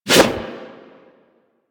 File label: Prime Ankyros Whoosh C; Tenno